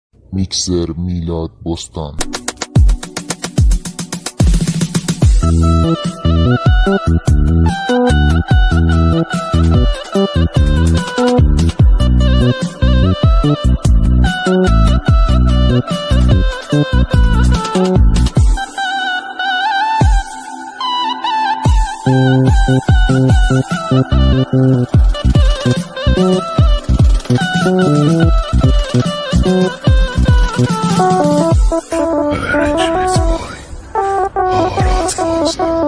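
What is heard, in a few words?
instrumental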